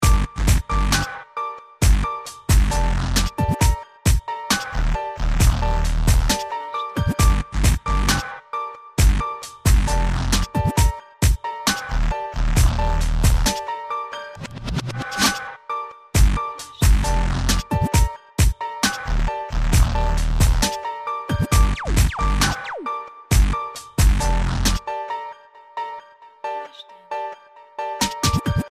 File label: dub